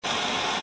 tv static cut slow

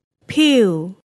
pew

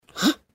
hah sfx

